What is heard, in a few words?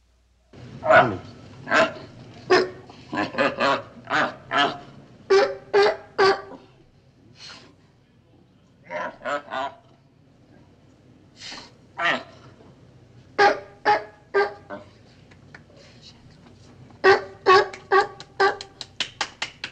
Seal Call; zoo; trip to the zoo animals sound sensory story